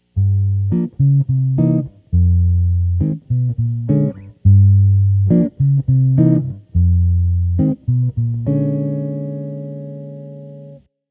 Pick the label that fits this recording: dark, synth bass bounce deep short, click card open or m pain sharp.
dark